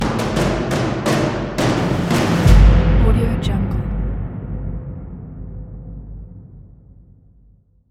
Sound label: cinematic trailer drums